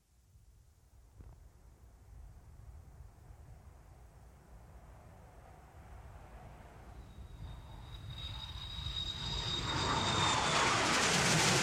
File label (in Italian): AEREO A REAZIONE